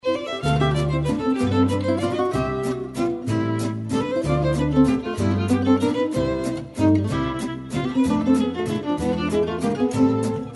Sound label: Valse, Gypsy swing